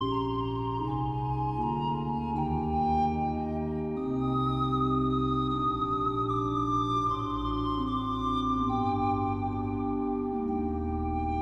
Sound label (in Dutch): panfluit en orgel